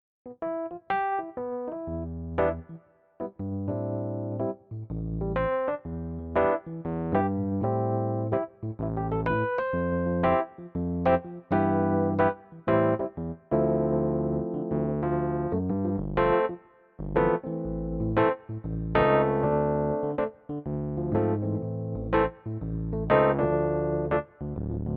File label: Roland RD 800 Rhodes